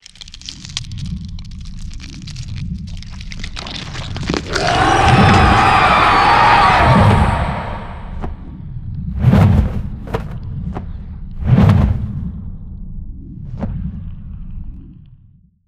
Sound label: sfx voidspawn hatchling; Stellaris; distant stars